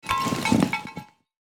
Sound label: Full backpack adjustment noise